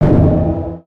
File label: Siege Tower Distant; destructibles